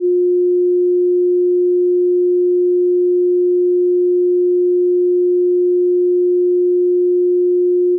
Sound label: echo park loop